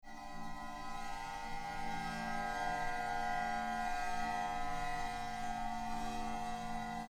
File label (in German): Ton